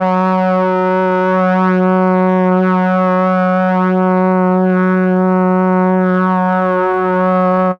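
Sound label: brs f sharp 2, Brass, MELLOTRON; Retro Sampler; Brass + Flute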